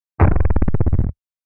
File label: Distort Slow Rip Lfe, distortion